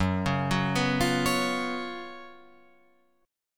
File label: F sharp Major 7th Suspended 4th, Guitar, Open F; F#M7sus4 arpeggiated; 6,8,6,6,8,8